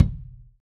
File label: Acou Kick, drum